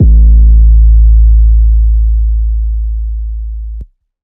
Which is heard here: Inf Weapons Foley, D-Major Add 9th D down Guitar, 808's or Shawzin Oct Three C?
808's